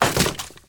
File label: Revolver Underlay Death